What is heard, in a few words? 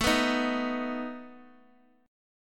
A Suspended 2nd Flat 5th A down